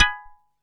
percussion; VES1 Percussion

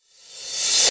Cymbals Reverse; Vengeance Samples

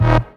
Synth Shots; Vengeance Samples

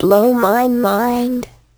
Vocal Phrases; Vengeance Samples; Vocals

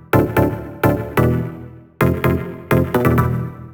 128BPM Air Raid Melody; VFH1 128BPM Air Raid Kit

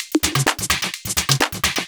Houseloops 128BPM; Vengeance Samples

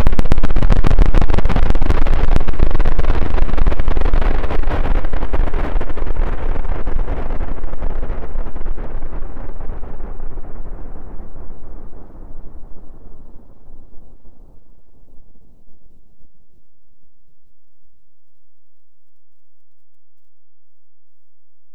VFX2 Sub

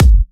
Kicks; Vengeance Samples

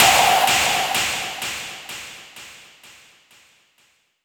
Impacts

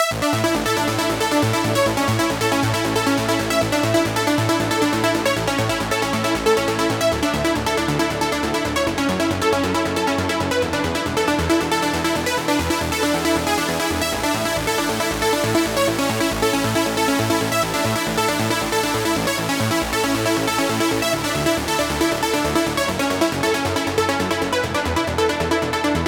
Mainpluck WET, 137BPM, A major; Unlooped

